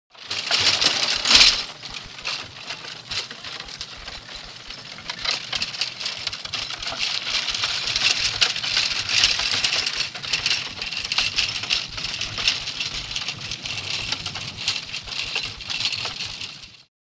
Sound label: Donkey & Cart; By; Donkey And Cart Constant On Gravel. Nice Crunchy Cart Noise.